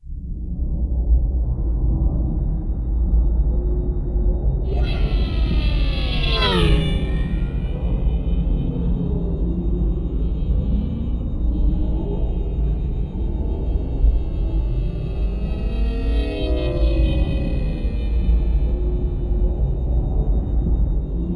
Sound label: SUBSONIC; AKAI Anime Sound FX; AMBIENT